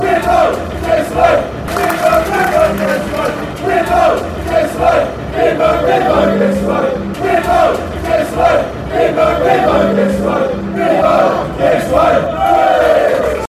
give us wave, fanchants; bibbo

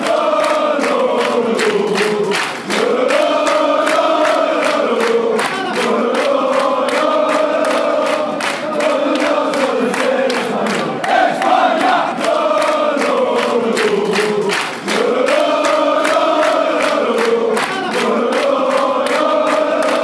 orgulloso de ser espanol, fanchants

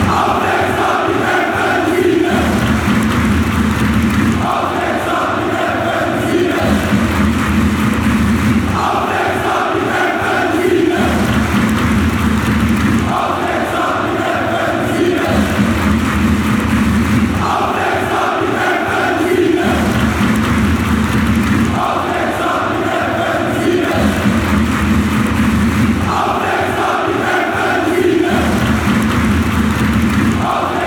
come rapid fight and win, fanchants

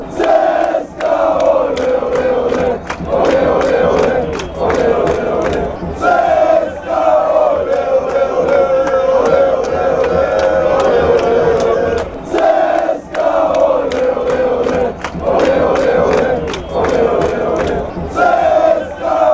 cska ole ole ole, fanchants

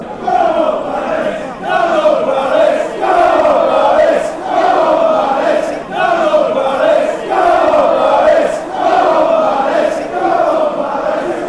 come palace, fanchants; southampton

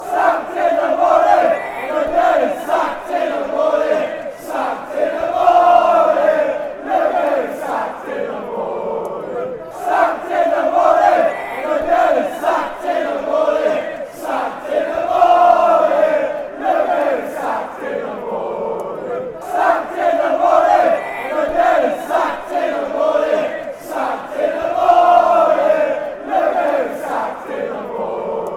sacked morning, fanchants; ncafc

